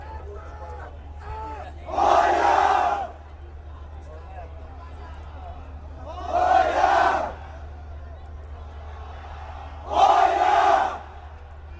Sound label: hungarian football chant